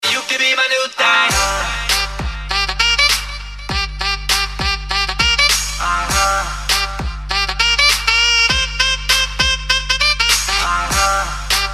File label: Sax